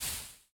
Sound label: Footstep Foliage Bush-Big